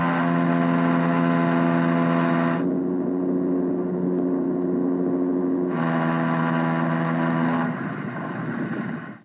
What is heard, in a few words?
car